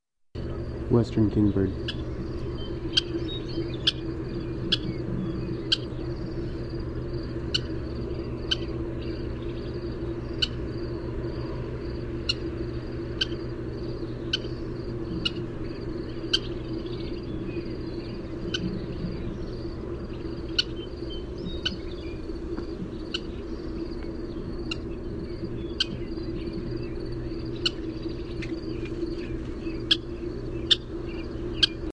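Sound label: Western Kingbird kip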